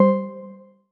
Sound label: Noise Cancelation, AirPods Sounds